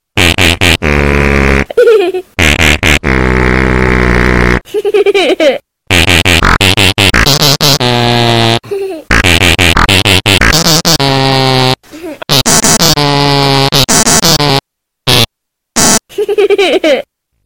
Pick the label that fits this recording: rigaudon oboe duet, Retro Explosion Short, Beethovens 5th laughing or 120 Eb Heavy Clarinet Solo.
Beethovens 5th laughing